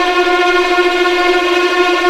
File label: STR TREM, Symphony Orchestra, STR Vls Tremolo; Roland LCDP08 Symphony Orchestra